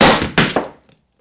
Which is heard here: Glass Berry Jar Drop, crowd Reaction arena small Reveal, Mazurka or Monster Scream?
Glass Berry Jar Drop